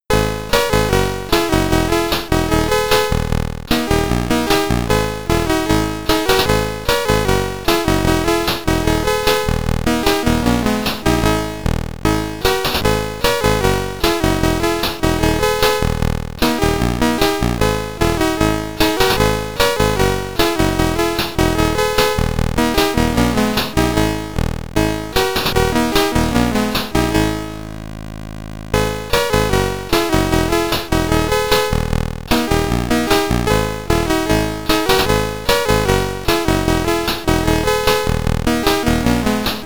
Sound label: SID Stereo, commodore 64; Wizardry The Edge; Flying Skull Theme